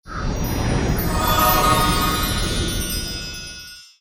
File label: Crystal Effect Sms